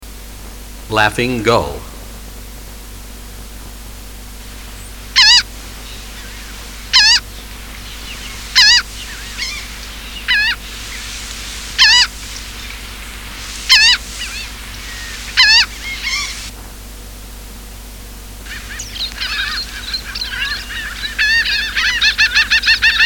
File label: laughing gull